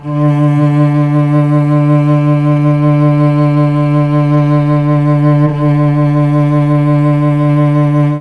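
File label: D4 chamber cello, mellotron; CHMBLN CELLO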